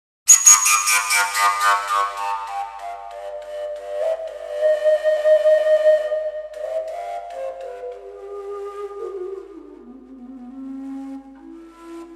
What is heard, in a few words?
overtone fujara